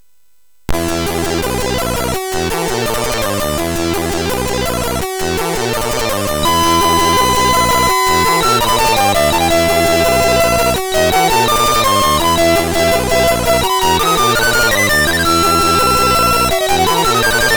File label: Music Demo Version, MOS6581R3; hvsc